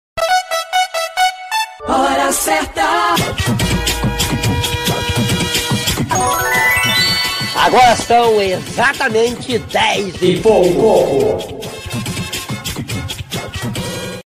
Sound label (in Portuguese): ritmo de funk